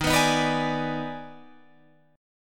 D sharp Major 7th Suspended 4th Sharp 5th, down Guitar Open F; D#M7sus4#5; strummed; chord shape